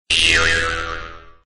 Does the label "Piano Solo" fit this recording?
No